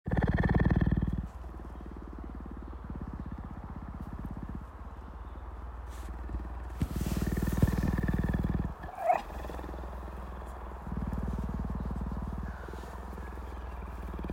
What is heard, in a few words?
Cougar Purr